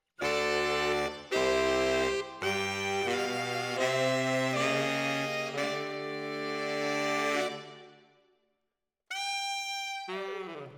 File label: ORTF SCHOEPS, piano